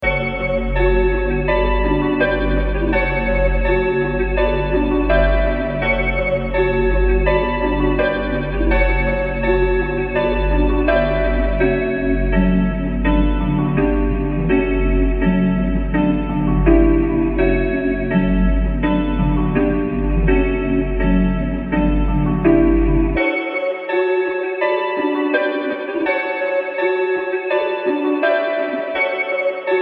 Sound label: F min 166 BPM Piano Keys Bass Lead